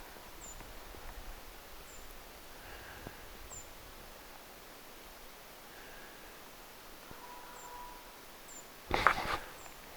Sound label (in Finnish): ensimmäinen ti-puukiipijä; liikuskeli puiden latvuksissa